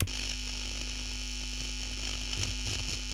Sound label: AMP HAM; 1000fx